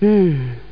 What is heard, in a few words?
hmmm